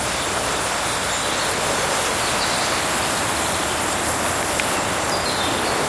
Fort Forest Loop

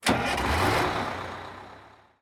Bus Ignition

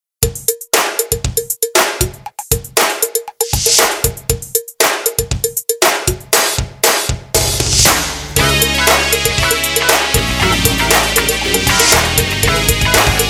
Ringside Funk; Heaven Grand Dad

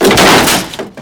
Physics Wood Crate Break; Counter-Strike: Global Offensive

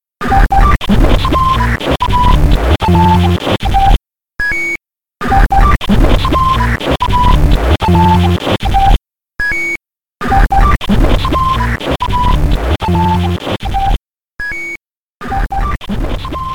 Glitch; Super Meat Boy; PS Vita

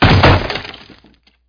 Zombie Break; CastleMiner Z; Xbox 360

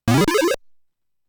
Punch Sound

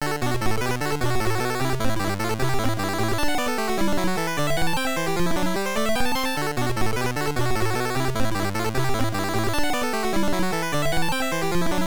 Super Spray (Faster); donkey kong 3; arcade

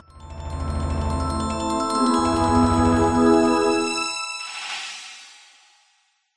Magic level up

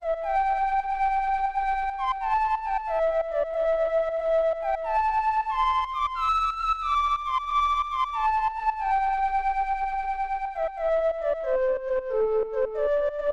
Quiet Steam Bath; legend of the mystical ninja snes

